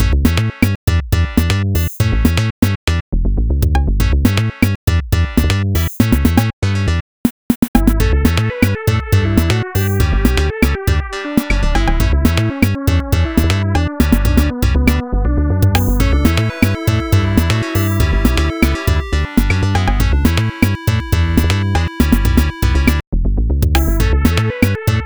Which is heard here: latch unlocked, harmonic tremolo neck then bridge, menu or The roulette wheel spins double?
menu